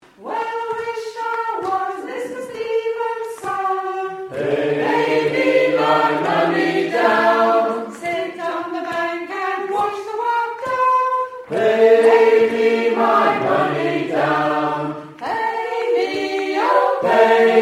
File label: bass